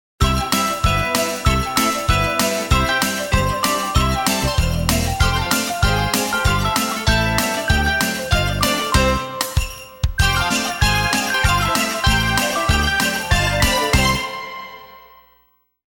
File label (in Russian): dzhingl bels; Новогодняя музыка